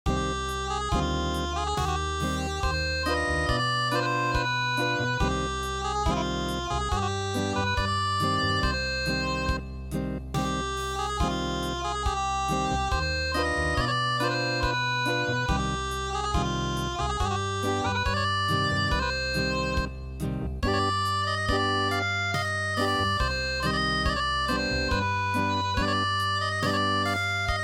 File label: Acoustic